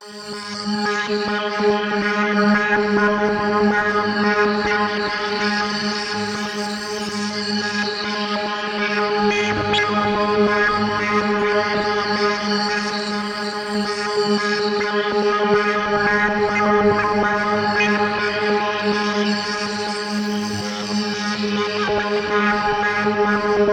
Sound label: Atmosphere G# 142 BPM, psytrance; Aspired